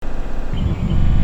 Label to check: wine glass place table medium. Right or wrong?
Wrong